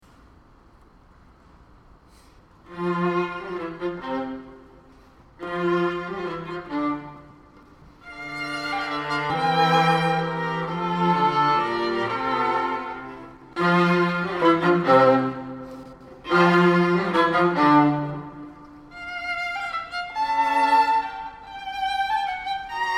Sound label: String Quartet